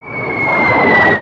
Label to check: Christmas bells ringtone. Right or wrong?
Wrong